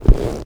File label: padding walk